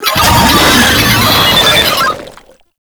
Proto Infested Brachiolyst Attack, Vocals, Enemies; Warframe